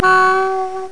WOODWIND; WINDS

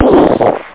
burst; Xcopy 2.2

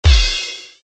slam

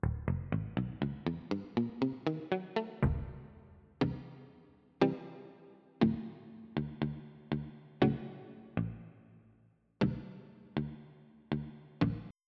Palm Mutes

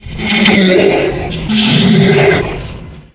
boss taunt

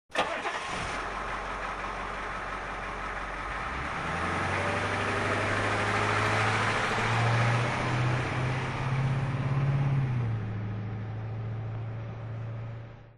bus drive

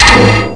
industrial hit

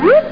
floop

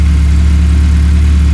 idling; Stunt GP; petes

